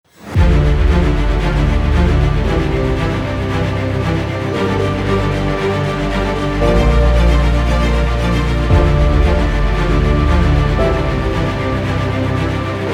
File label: epic motivational dubstep